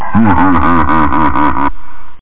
h laugh

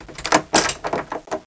lock; Nukem3d; duke

